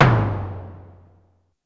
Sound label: Drum X Snare; Pearl Drum-X